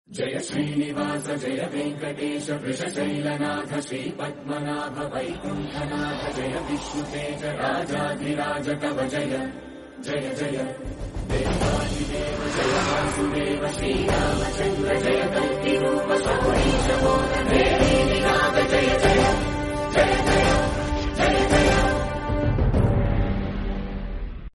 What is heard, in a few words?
logo bgm telugu; dvv entertainments